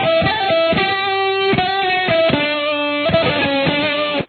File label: solo